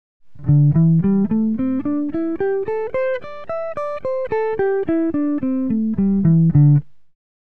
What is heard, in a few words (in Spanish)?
penta menor patron